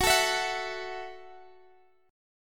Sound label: Gb Minor 7th Gb Guitar Open G